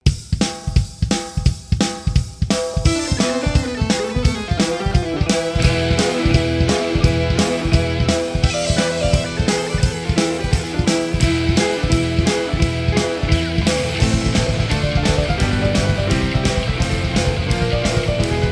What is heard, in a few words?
prelude